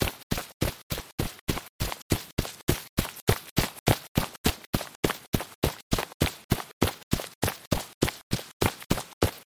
dash lawn

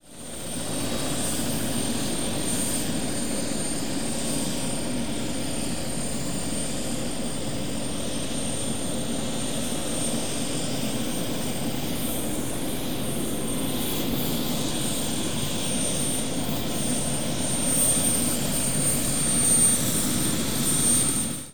helicopter wait

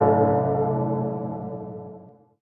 clock tower bell

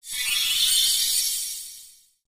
magic status cure

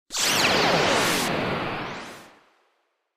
beamgun

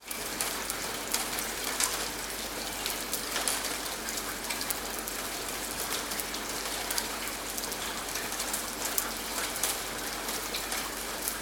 rain

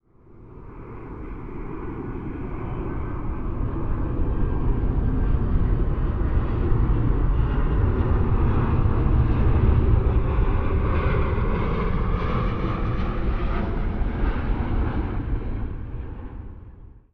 airliner pass

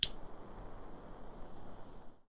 lighter ignition

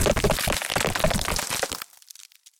rock crack

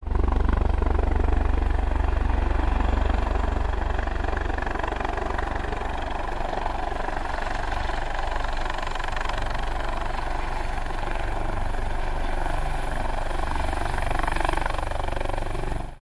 helicopter landing

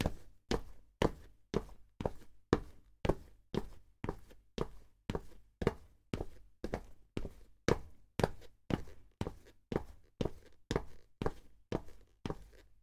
walk asphalt

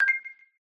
decision, button

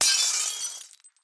glass break

